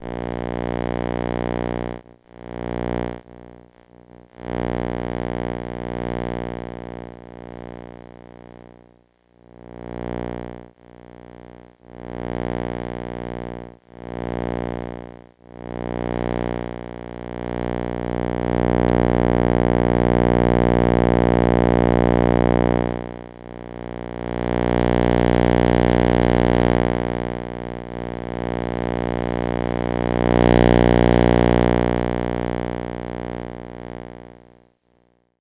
Tv hum

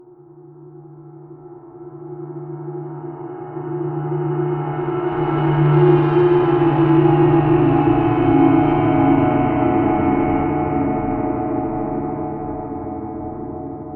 Creepy Ambiance

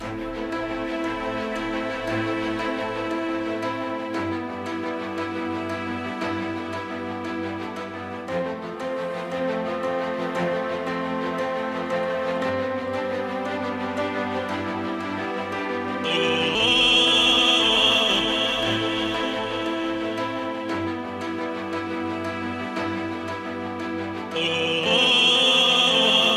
Piano Loop Vocals

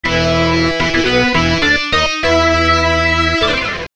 Victory Sting

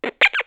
Cracklebox Gritty Bleeps

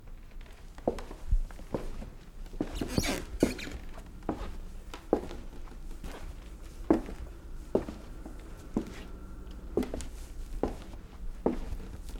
Walking on squeky wood floor

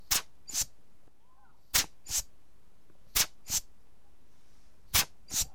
Blower Brush

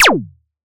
Laser

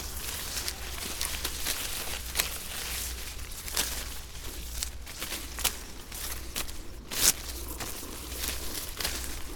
Bush Walking

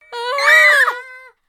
Kids Screaming

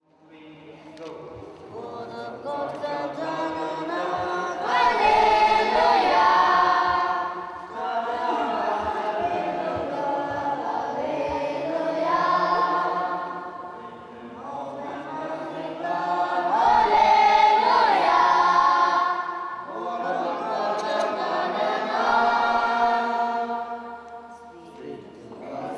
Alleluiah, Dibrughar

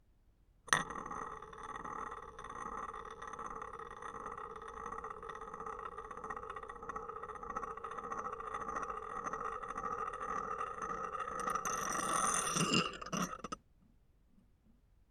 Spin The Spinning Top Stop And Fall

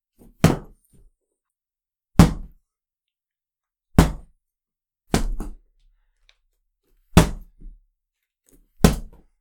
Series Of Kicks

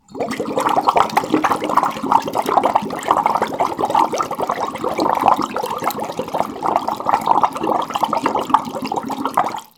Very Intensive Bubbles

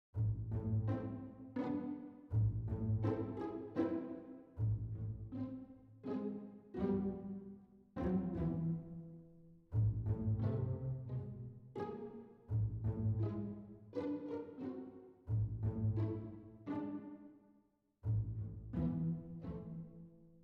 Mystical Film Score Soundscape Wonder

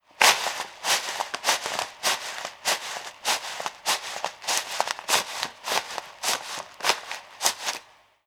Sand Footsteps

